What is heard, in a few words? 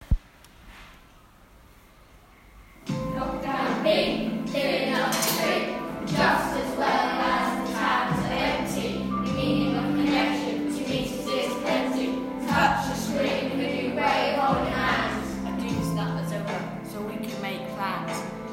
Beat Lockdown Rap